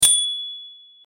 bicycle bell

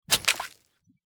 footsteps in puddles

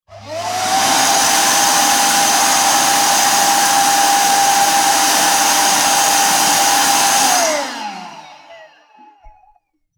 Vacuum

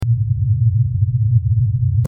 Space station ambience

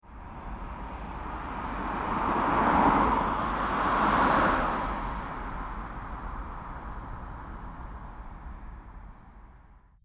City traffic; Sound FX

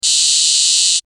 Tech gadget buzz

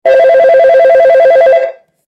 Phone ringing classic

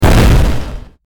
bomb explosion

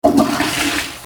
Toilet flush

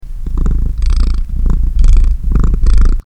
cat purring

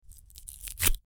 Potato peeling

